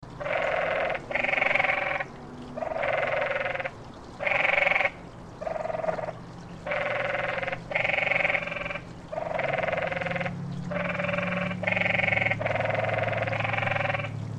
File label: frog pond; az sedona